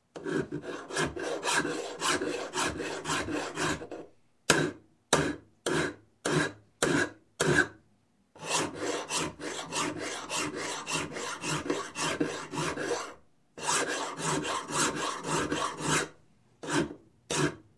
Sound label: Construction, File Filing Metal; Sound Ideas 2000 Series